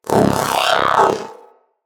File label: Companion Vocal Alert, Pets; Zanuka